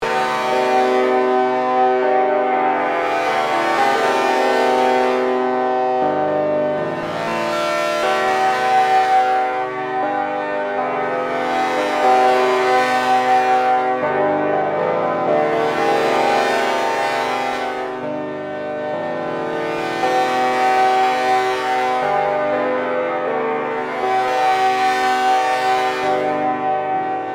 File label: strings variations